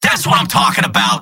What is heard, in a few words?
scout positive vocalization; tf2; mvm